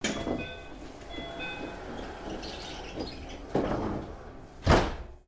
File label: monorail door